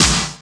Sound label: 80s Snare SP12; Drums